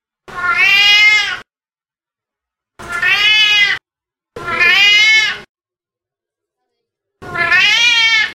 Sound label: miaow